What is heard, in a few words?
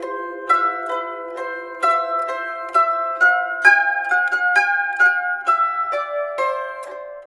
SITUR, SITUR PH; Spectrasonics; Heart of Asia